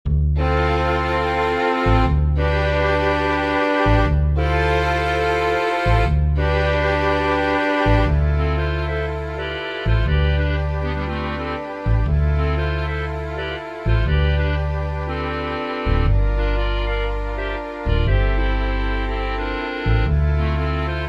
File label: master mix, MIDI; The mix above is a guide of how the tune should sound, using MIDI; online orchestra